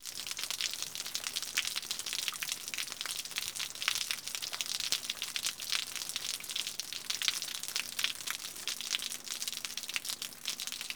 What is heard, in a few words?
Rain Drops From Roof